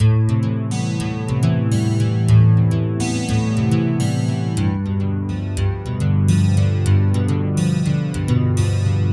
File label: Acoustic Guitar; Sonic Foundry (Sony Creative Software); Mac Money; Guitars